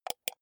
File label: computer mouse wheel click